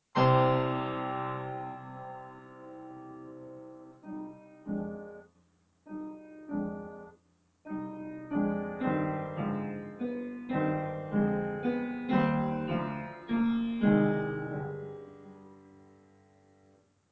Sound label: Brahms Third Piano Trio opening